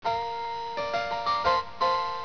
cheers

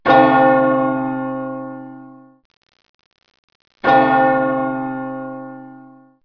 bell; de flow

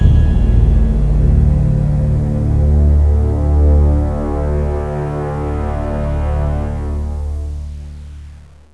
buzzer army intro; cs paranoia

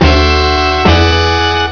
salsa; kart race

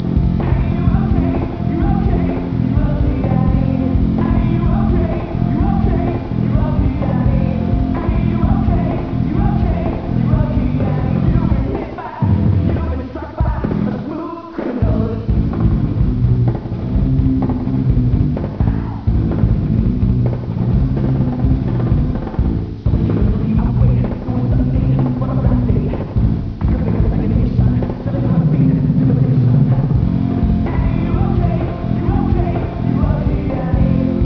disco

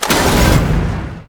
propulsion draw, weapons; deluge

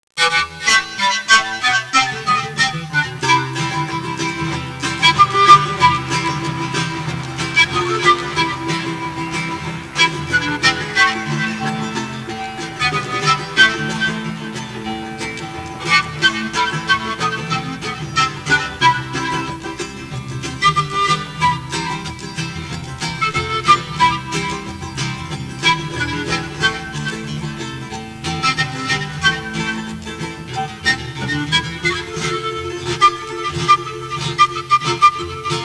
end temple

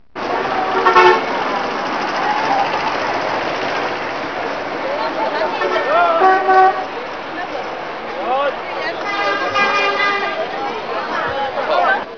Paris traffic

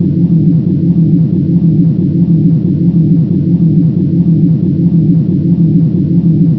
silo loop; de code

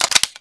sten reload clip in, weapons; svencoop; ww2projekt

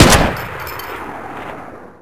mp44 shoot, weapons; svencoop; ww2projekt

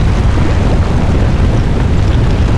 Lava

